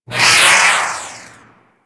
Tractor Beam Rail Random I; Crp Space Bridge Transition

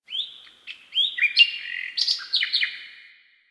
Bird Nightingale; Warframe; Ambience; Nineteen Ninety Nine

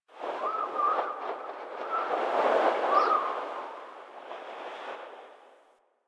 Wind Gust, Ambience Plains

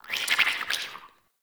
Root Cave Creature Close; Neathuns

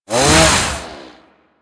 Ghoul Sawman Melee Swing; Warframe; Grineer Ghouls

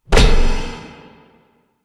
Bash Hit; Warframe; Warframes; Hoplite

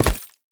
Step Default, Foley, Footsteps; Warframe; Lasrian; Lasrian Soldiers; Lasrian Butcher

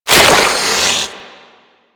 Proto Infested Mutalist Big Jump Flesh; Warframe; Abilities

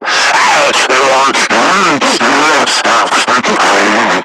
Screamer Idle; Warframe; Lasrian

